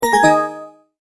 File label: multimedia tone end